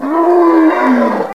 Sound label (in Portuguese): errou; GEOGRAFIA